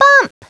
bump; Treasure Galaxy (1994); Learning Company

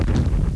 Exp Small; America Invades! Demo

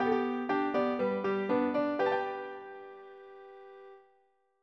trumpet; Dosgames 2.0; dungeon

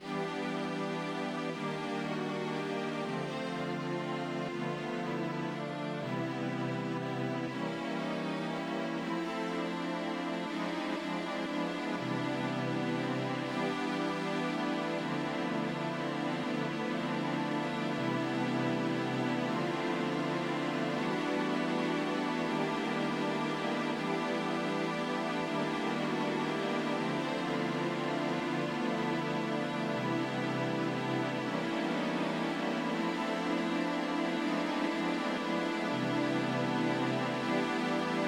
waltz

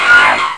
electric saw; Sound Effects for Multimedia - Volume 1; electronic sounds

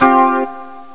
chuk fuzz sus4

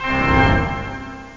full pipe organ; OctaMED SoundStudio V1; mirage

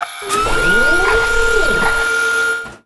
ship wings open; Earth and Beyond; EA Games

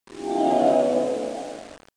Dive

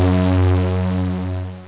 buzz out

